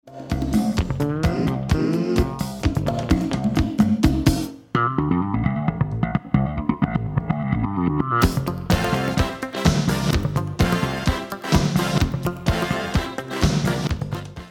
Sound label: solo